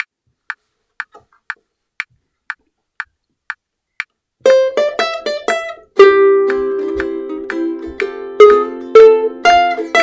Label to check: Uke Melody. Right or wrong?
Right